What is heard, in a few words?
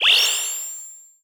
inspiration